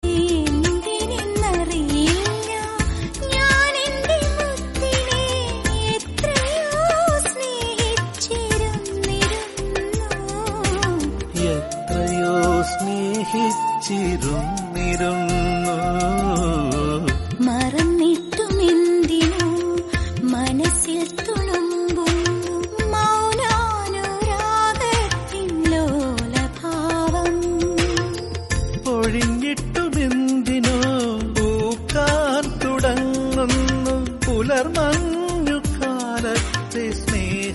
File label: malayalam evergreen ringtone